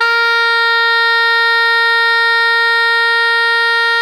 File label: SOPRANO A#3, Saxophones; FF; Greytsounds; Philip Wolfe David Crozier's Saxophones